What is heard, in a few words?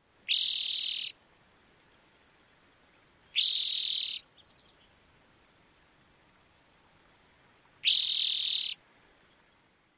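songs, Spotted Towhee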